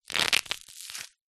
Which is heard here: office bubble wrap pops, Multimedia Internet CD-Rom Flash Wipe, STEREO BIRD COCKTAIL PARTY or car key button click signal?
office bubble wrap pops